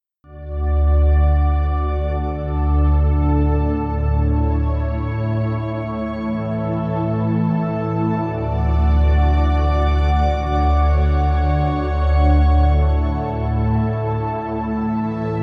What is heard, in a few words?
Korg Slow Pad